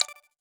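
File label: Chat Msg In, phone message tone; Nokia; E63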